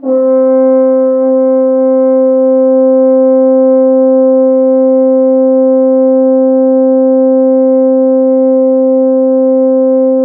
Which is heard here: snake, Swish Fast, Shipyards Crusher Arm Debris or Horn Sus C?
Horn Sus C